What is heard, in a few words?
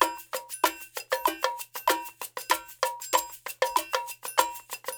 96, Percussionworks loops; Sampleheads; GJ LOOPS 96